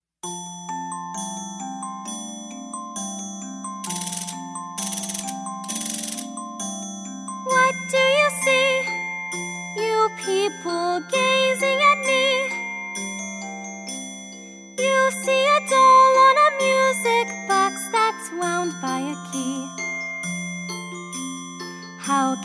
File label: Music Box Audition Vocals